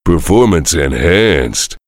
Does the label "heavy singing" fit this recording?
Yes